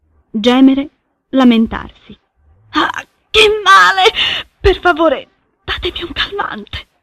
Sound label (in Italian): gemere lamentarsi